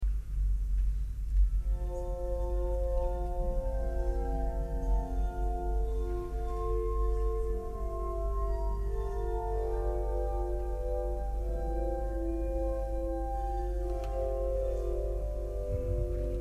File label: Grazioso; Reichardt